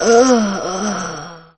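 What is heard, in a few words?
die, alien controller; nobita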